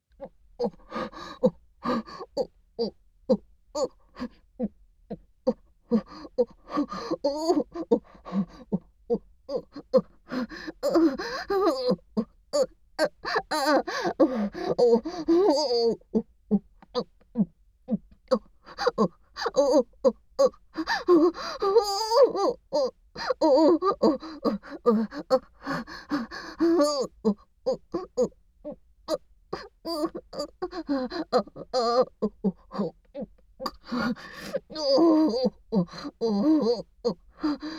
ご奉仕も忘れて無化夢中オホ声ループトラック, ASMR

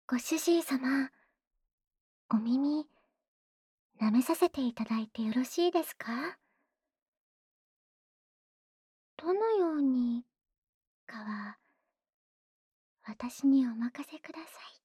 耳舐め 右 ハード; メイドdeフェラチオ

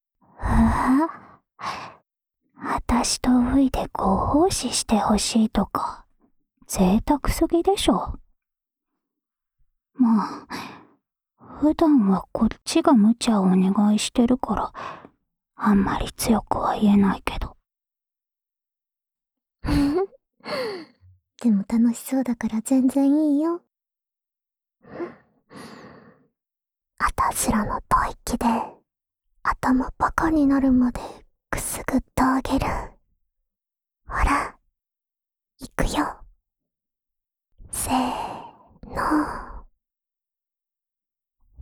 安眠用お耳ふーふーループ, ASMR; 兎衣と桐那の安眠用お耳ふーふーループ

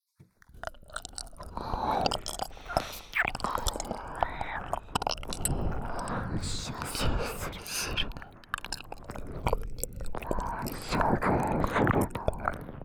耳舐め囁き 射精するな

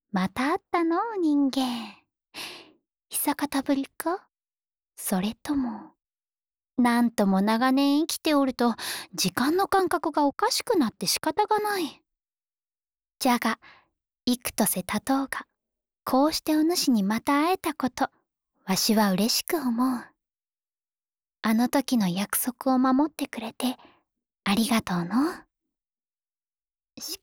安眠ボイス＆寝息（左）, ASMR

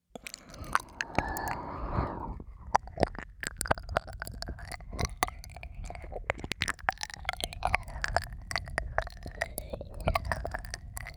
癒しのじゅぽ耳舐めループトラック, ASMR

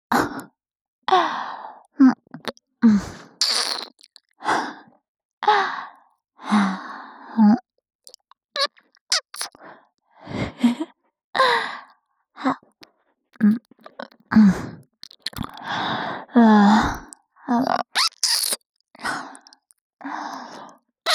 右耳舐め(ループ); 瀬里奈の右耳舐め

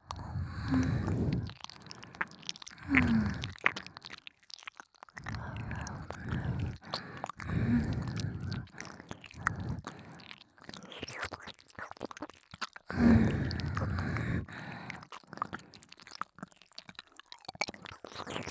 耳舐め, ASMR; 片耳

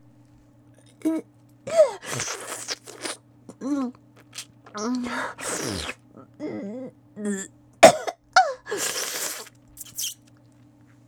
喉奥フェラ

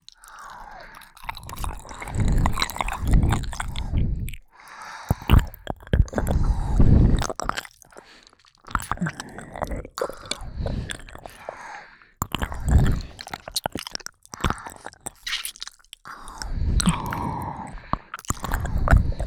二人で耳舐め; 二人に犯され

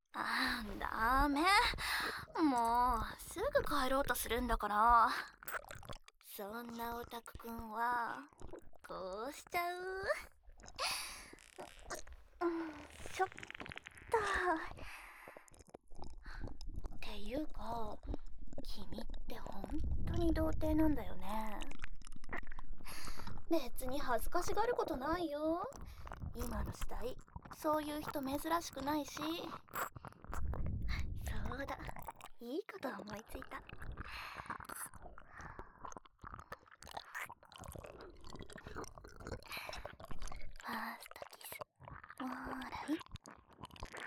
耳舐め キス 乳首舐め 手コキ フェラ 素股 騎乗位 キスハメ 音声; 31分間耳舐めされながら、相澤さんとキス・乳首舐め・手コキ・フェラされおっぱいを愛撫し、素股・騎乗位でキスハメする音声

